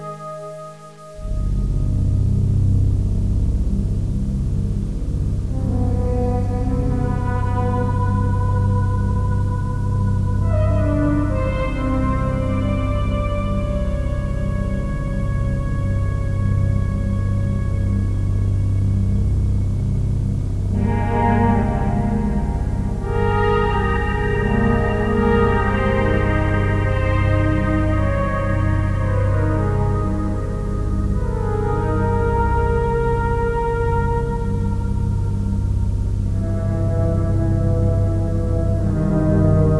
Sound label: Song